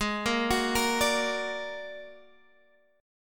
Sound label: A sharp Augmented 7th G sharp, guitar